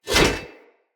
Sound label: Whoosh, Swords; Weapons; Mockery; Turash